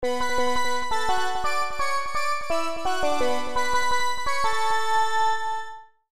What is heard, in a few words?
tropic title brass